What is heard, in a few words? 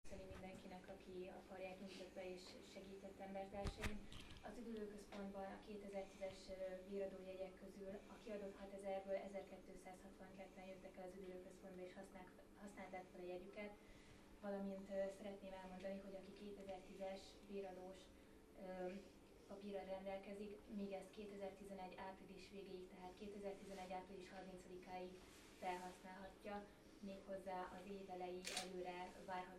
digitar